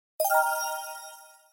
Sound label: Fold open; Nokia N76